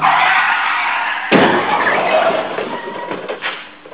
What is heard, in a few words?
paranal software car crash; soundscape